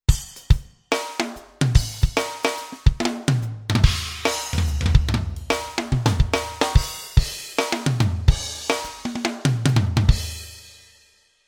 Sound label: Tight Rck KIT; xp-80; roland; TightRck1KIT patch demo; roland demo cd-3